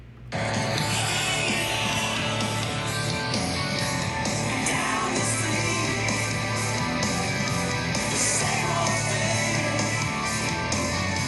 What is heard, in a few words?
hanginout